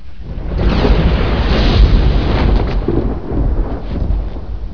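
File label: THUNDER; The Ultimate Guide to Mortal Kombat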